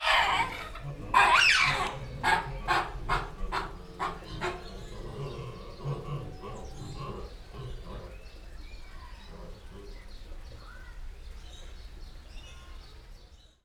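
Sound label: monkey Voices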